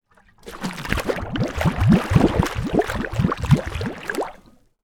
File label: Water; TOOLKIT vol.2